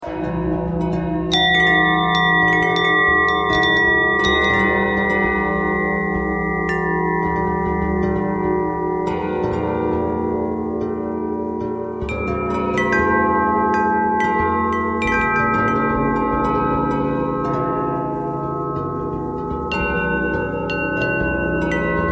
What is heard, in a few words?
bell bell thread noise, bells